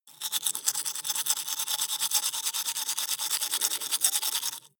teeth brush dry open inward

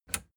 plastic button latch release enable

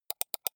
computer mouse left click double soft

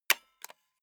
stapler staple loose hard

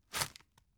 tissue take hard

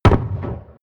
shotgun distant shot

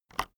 keyboard external enter release

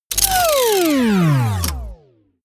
wind down

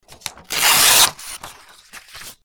paper torning

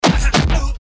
fist hits and uhh

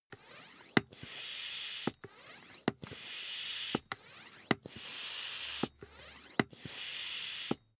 air pump ball sequence

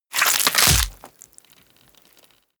gore rip body apart short

